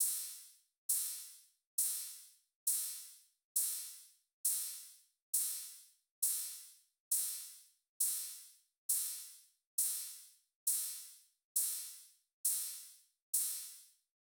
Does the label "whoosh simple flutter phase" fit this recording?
No